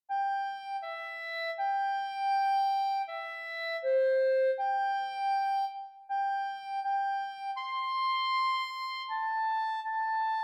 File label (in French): CLARINETTE